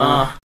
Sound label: uh male chorus; ooh ahh; uh um